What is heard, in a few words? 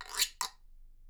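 guiro, Percussion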